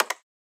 Hire Repair Click; The Avali's Audio Overhaul

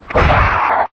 attack; Barotraumatic; Cthulhu; InsanityMonsters; Hallucination2; Mudraptor

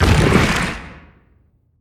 spike use; Barotraumatic; Thalatocyte

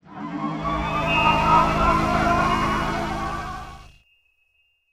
sonar Power On; Barotrauma; Real Sonar

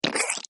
gross slime squelch; Barotrauma; Barotraumatic; Seasnek

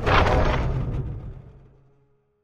structure crunch; Barotrauma; Subsurface; Damage

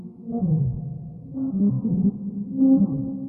Ice Whale Idle; Barotraumatic

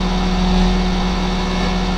scooter, Diving; Barotrauma; Subsurface

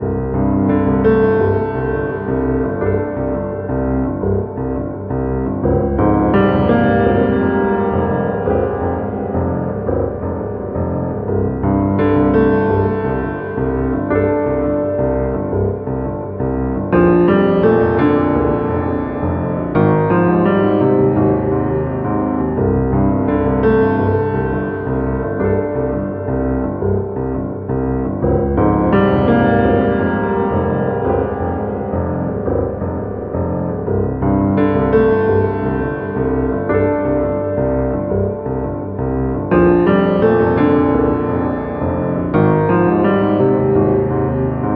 Piano Subaquatic; Thalassophobia Restored

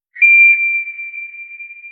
sonar Ping Water Far; Barotrauma; Real Sonar

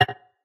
UI msg; Enhanced Immersion (NO ITEMS OVERRIDE)

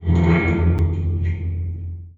structure crunch; Damage; Subsurface; Barotrauma

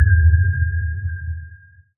sonar Tail Suit Very Close; Barotrauma; Real Sonar

